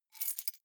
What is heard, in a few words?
key ring grab stone; objekte; schluessel